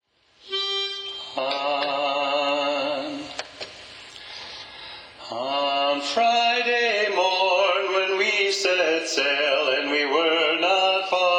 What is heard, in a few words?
The Mermaid (Lead Predominant), sea shanties